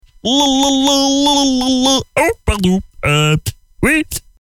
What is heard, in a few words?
Lalalawww, ACAPELLA; pack noel